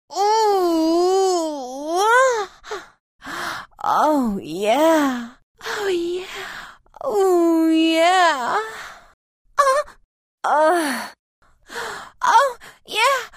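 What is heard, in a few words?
ston jen, women moan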